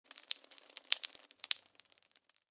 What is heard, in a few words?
Blood dripping onto the floor; Free Blood Sound Effects